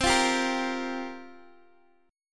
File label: C 7th Suspended 4th, Guitar, Open D